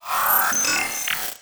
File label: system on line